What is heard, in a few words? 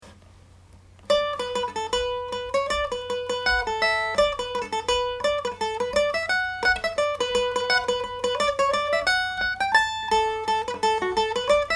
longford tinker, Irish Mandolin